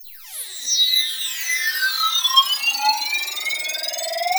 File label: chirp